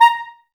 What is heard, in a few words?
Clarinets, Wood Winds; Miroslav Vitous - Woodwind Brass Ensemble